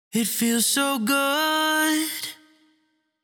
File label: Vocal Phrase "It Feels So Good", 128, Bbmin, Wet